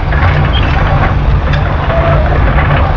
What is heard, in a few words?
Engine Damage